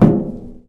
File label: metal box footstep